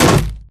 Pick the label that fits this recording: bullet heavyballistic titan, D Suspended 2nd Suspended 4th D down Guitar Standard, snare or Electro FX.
bullet heavyballistic titan